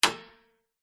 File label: foley cash register till drawer plastic sprung note bill arm lever release